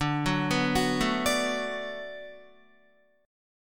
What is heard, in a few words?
D 6th D down Guitar, chord; arpeggiated; JGuitar